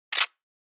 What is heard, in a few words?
Camera Film